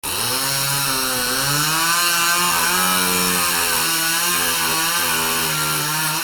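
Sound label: chainsaw; MOTORKA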